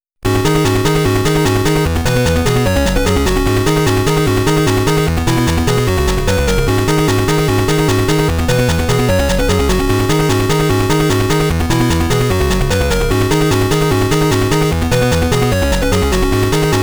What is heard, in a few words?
Character Selection